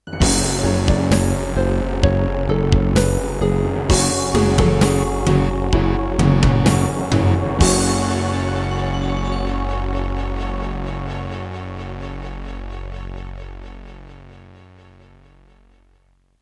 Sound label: Roland CM-300